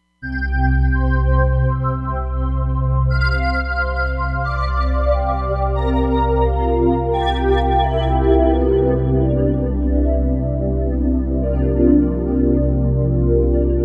Silk Pad, Roland Fantom XR

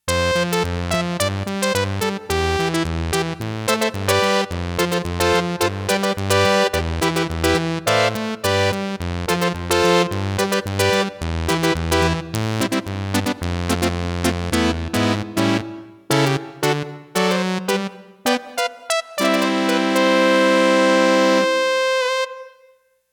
SAWTOOTH, Roland JX-1; Factory presets

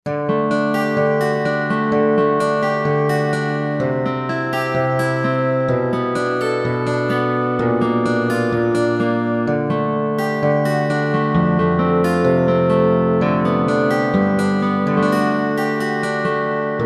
Finger Pick, Korg M1Rex; Factory Patches

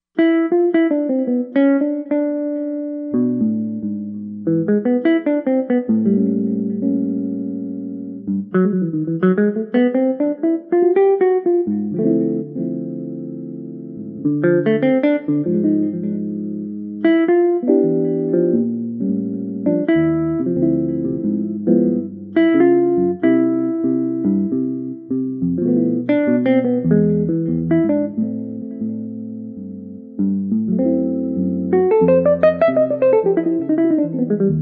Jazz Guitar

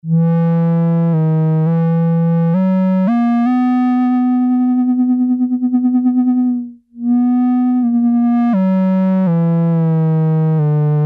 Saw; Alesis airSynth

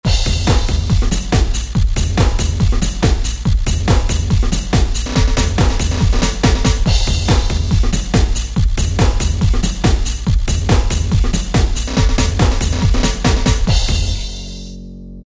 Breakbeats Trance; Korg ES-1; Factory Patterns

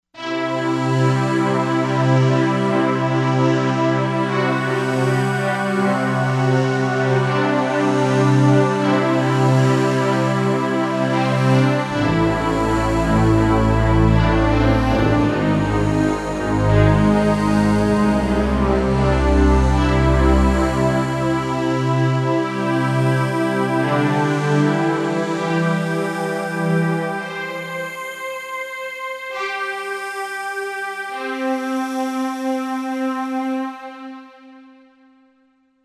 Steam Synth, Korg MSC-07S Synth

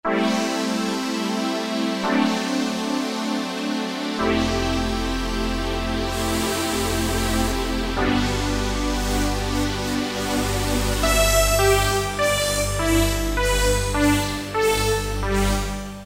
Garage Pad, Roland SR-JV80-06 Dance

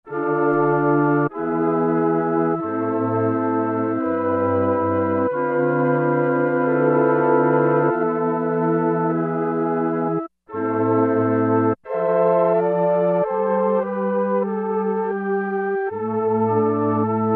Tape Flute; Roland SRX-03 Studio SRX

